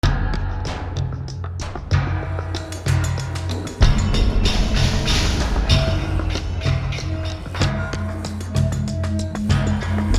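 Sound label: Battle Loop City Percussion; Fallout New Vegas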